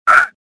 jump, worker; worker2

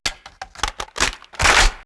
reload colt; weapons; Max Payne

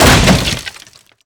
Crate; Totaled; XBOX

